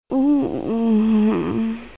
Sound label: Moan